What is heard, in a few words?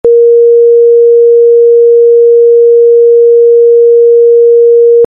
Tone